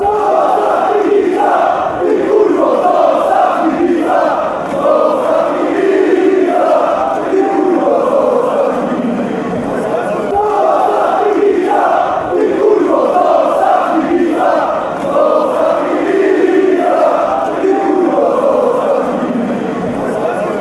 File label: leave fan alone, chants